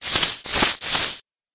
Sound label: monster corn snake